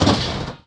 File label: Vehicle Jeep Side Impact; Playable Tutorial Level